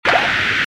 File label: Reykjanes Ridge sound